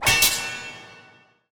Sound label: Ui Expedition Button; Android; Portal Quest